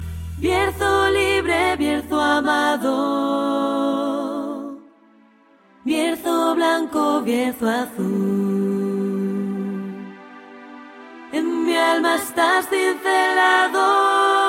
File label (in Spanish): melodia corta